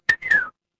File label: ui call end